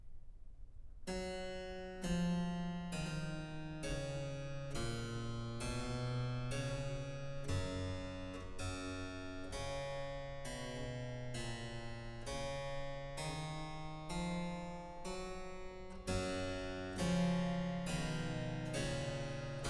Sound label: harpsichord solo, Canon simplex; Bach; Musikalisches Opfer BWV 1079